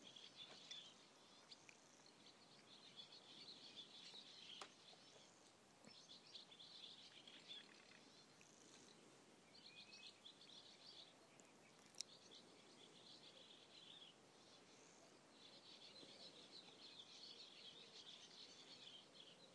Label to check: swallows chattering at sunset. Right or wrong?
Right